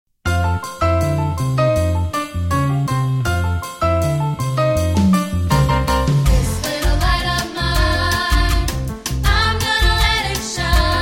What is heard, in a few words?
Vocal; This Little Light of Mine